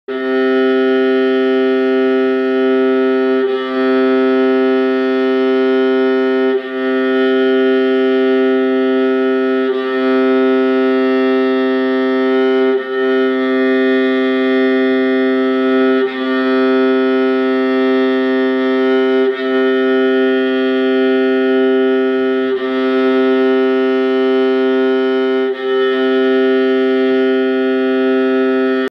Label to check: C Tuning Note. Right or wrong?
Right